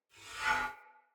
Great Sword Whoosh Accent; Weapons; Player; Mendicant Great Sword

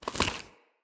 Acorn Plant, Objects

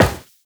Run Metal, footsteps; Warframe; Tenno

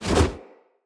Split Sword Whoosh Heavy; Weapons; Player; Swords; Starter

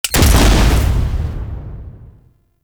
Pod Shotgun Shot; Warframe; Weapons; Tenno

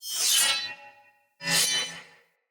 Curved Sword Finisher Front Accent; Weapons; Player; Swords; Sinecure

